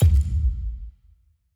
timer tick big; clash; lol

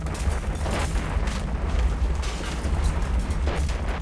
ceremony rumbling; tft lny2023

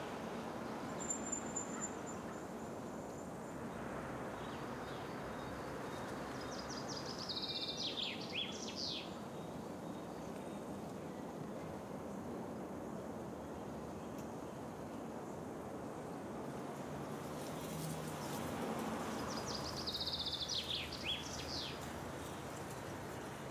amb

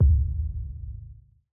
sfx uikit button locked click

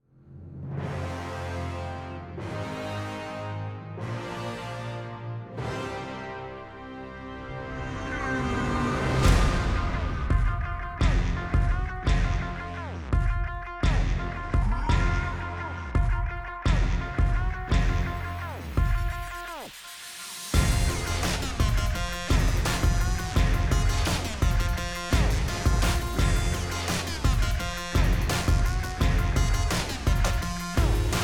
music all random; urf

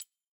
text click small; clash; lol

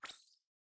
lobby button main hover; strawberry

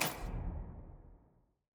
sfx trans archive modal click

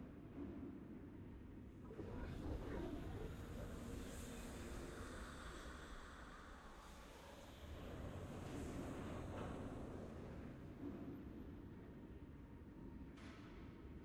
amb streets; piltover zaun zaun

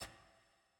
ui main act hover

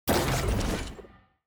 tier wings promotion; from master

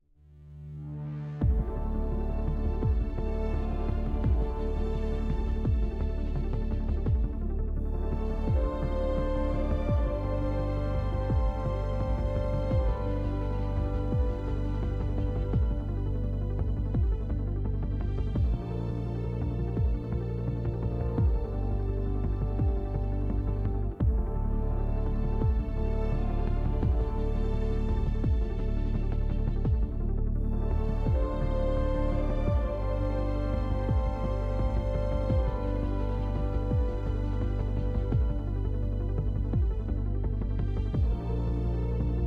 music inqueue loop; cherry

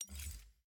browse open click

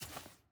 ui generic section paper click

